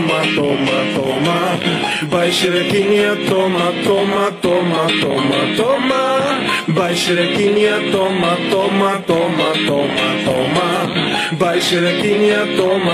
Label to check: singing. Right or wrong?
Right